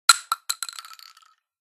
spread casing; spreadgun

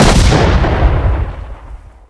Big M Hit

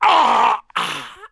Death; Marisa Kirisame

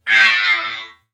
death

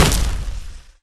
hit

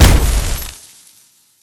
salt hit

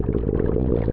Gel Load

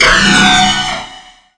Amp Fire

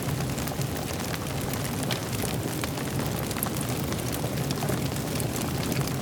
on fire

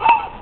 dog die; wolfstuff; wolf3d

